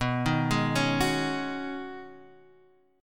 B 6th Add 9th B down Guitar Drop D

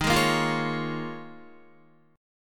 Eb 7th Suspended 2nd Suspended 4th Eb, Guitar; strummed

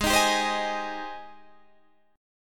Ab Major 7th Ab down Guitar Standard; AbM7 strummed; JGuitar

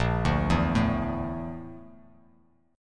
Bb Major 7th Flat 5th Bb Bass Standard; BbM7b5 arpeggiated; JGuitar

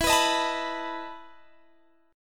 E Major 7th Suspended 4th E down; EM7sus4 strummed

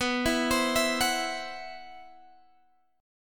C Major 7th Flat 5th B

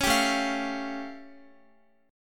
A Augmented 7th A Guitar; A+7 strummed; x,x,11,12,9,12

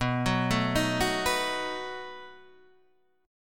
B 7th B down Guitar Half Step Down

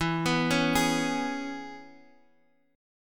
Db Minor 7th E

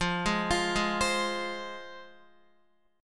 F Minor, down, Guitar; arpeggiated; JGuitar

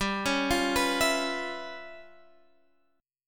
C sharp 7th Sharp 9th Flat 5th, chord

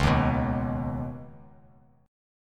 Db 7th Suspended 2nd Db down, chord; Db7sus2 strummed; JGuitar

